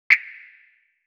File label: effect of glitch snap